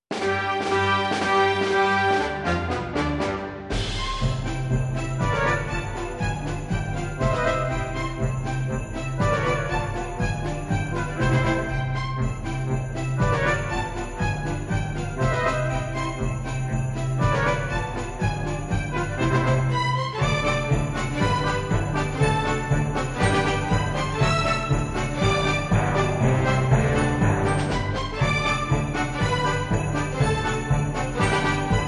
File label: Sleigh Bell Polka